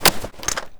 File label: pickup open, foley; stinger